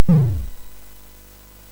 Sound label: SFX, MOS6581; Narco Police; MOS6581R4; hvsc